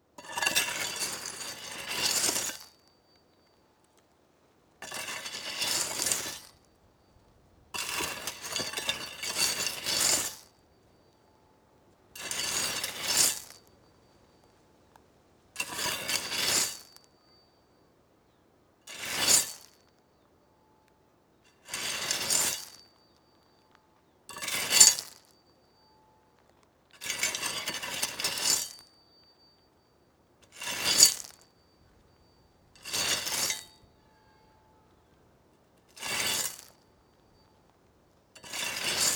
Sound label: Digging; TheWorkRoom Audio Post